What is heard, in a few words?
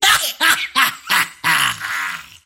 wheel laugh; pudge